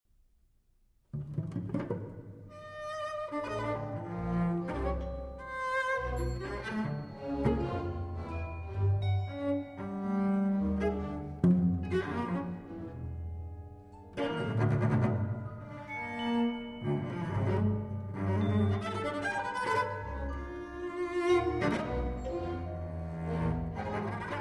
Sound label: Cello Concert Doppio Piu Presto With Orchestras